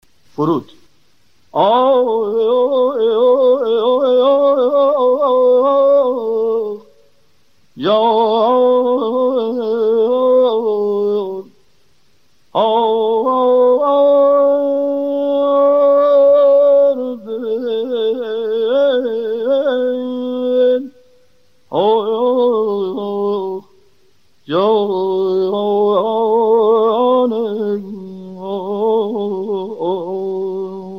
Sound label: forod